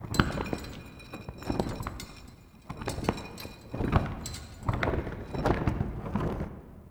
Prop Flag Flaps; Warframe; Clan Dojo; Dagath

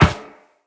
Operator Run Metal Perforated, Footsteps; Characters

